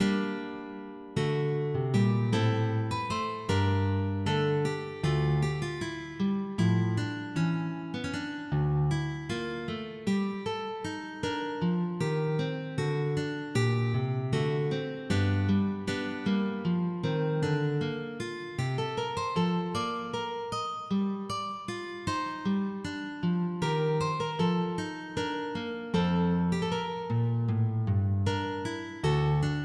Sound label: Courante; de Belleville